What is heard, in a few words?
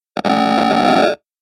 robotic glitch